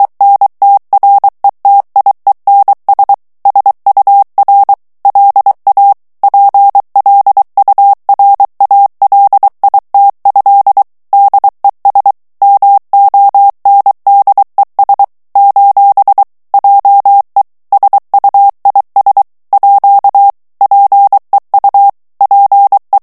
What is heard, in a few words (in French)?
FRANCAIS TEXTE CLAIR VITESSE 22 MOTS MINUTES, morse